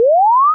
string; tuxpaint; magic

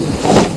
Factory noise; memory-sound; gcompris-qt

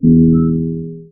guitar; gcompris; memory-activity

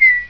shrink; tuxpaint

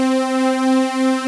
string, strings n pads; lmms; orion

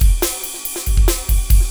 break, beats; lmms

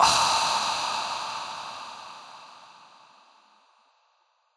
breath; lmms

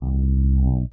bass; fofix

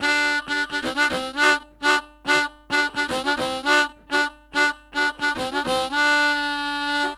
harmonica; gcompris-qt

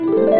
rainbow; tuxpaint; magic

